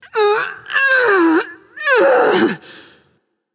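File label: grunt lift, female; smite; voicepack; hera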